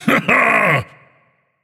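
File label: non-verbal yay; smite; atlas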